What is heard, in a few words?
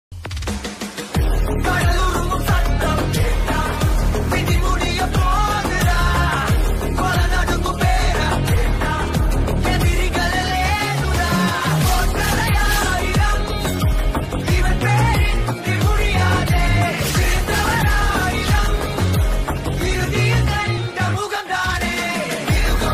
ringtone telugu; devara; ntr